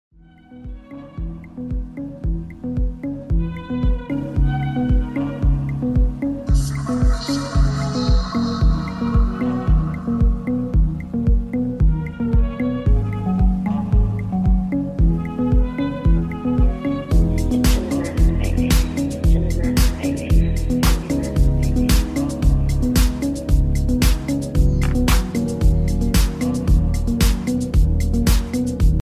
ringtone, english

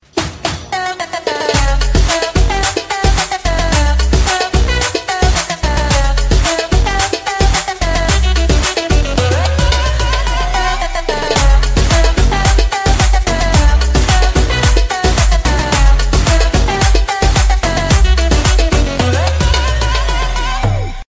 dj iphone ringtone; samsung ringtones

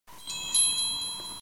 xiaomi wind chimes ringtone

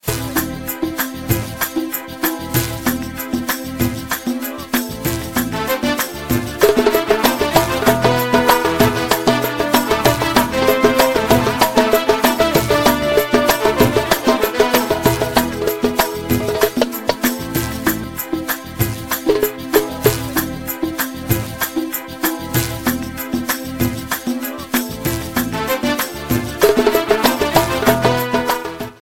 instrumental rai ringtone

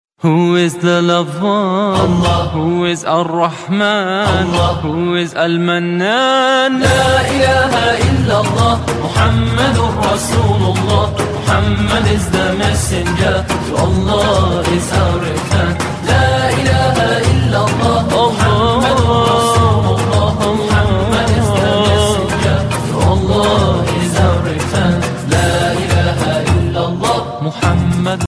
islamic tone ringtone

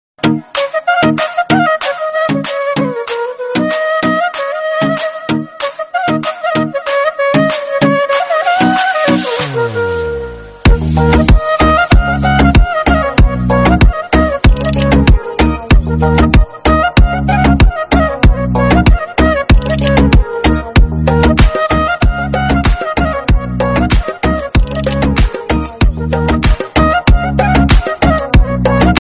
flute background bollywood song tone ringtone; bollywood ringtones

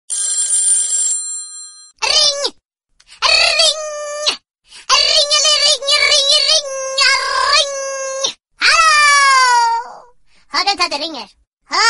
funny ring ring musical tone

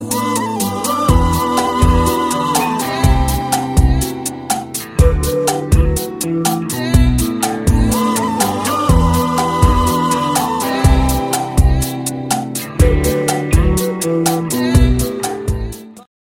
romantic background music

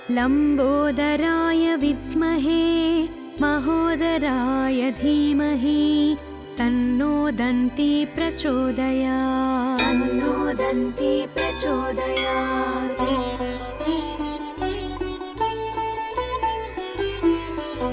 ganesha sthotram telugu

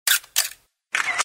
click click ringtone

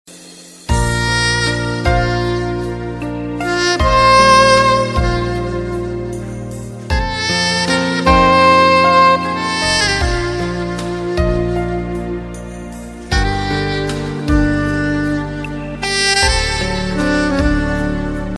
saxophone musical ringtone

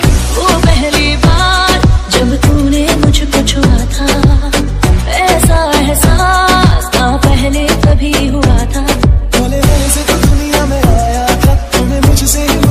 sad ringtone

